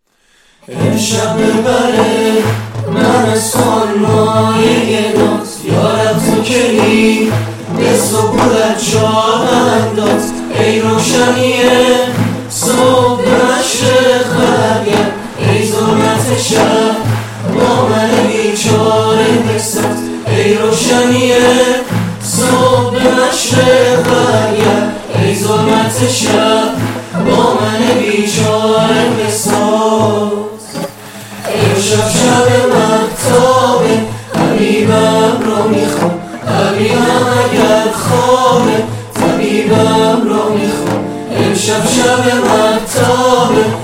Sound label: music hamkhani gorohi